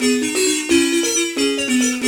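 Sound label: 134 buzz xylophone phrase, African; Roland LCDP11 Africa VOL-1; Bala & Xylo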